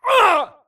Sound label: grunt death quick; Titanfall 2